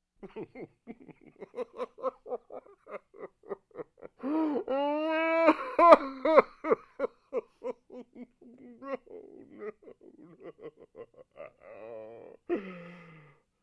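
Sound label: sounds of male crying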